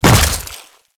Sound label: Grineer Step Goo, Footsteps; Enemies; Warframe